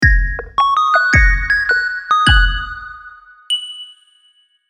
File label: Logo Mobile Game